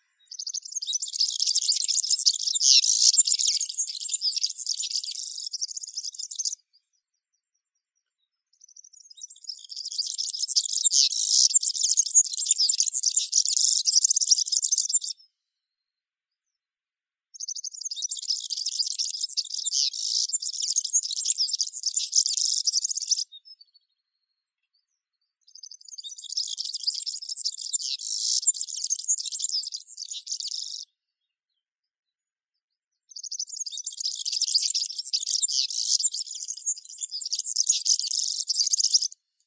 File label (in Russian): vurok evropeiskii serinus serinus, zvuki prirodi